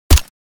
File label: FN SCAR assault rifle suppressed shot